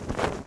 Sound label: Cloth Move; Civ4 warlords